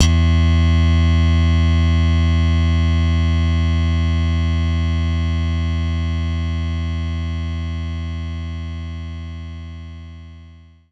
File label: Slap Bass E3